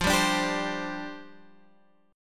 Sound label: G Suspended 4th F; Gsus4/F strummed; JGuitar